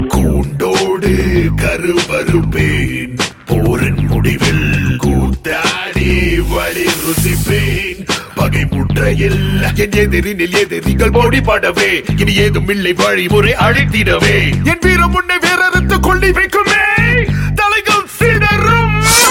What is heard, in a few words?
Hiphop; Aadukalam